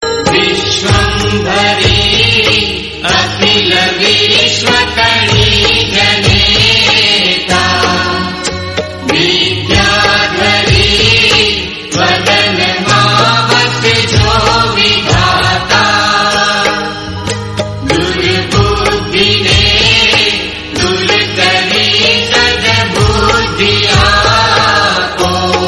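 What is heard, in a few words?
Gujarati mantra stuti ringtone; vishvambhari; mntra stuti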